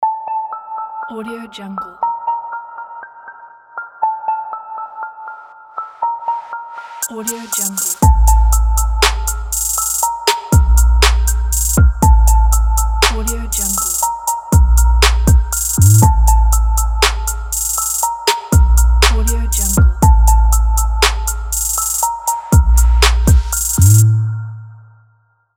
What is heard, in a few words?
dark trap logo